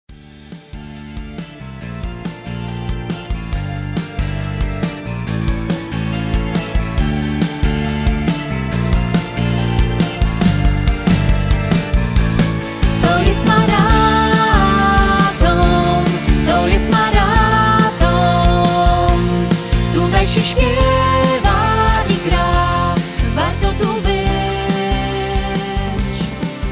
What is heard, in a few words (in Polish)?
wersja wokalna